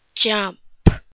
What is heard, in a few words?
jump